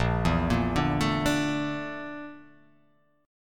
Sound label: A sharp Major 7th Flat 5th A sharp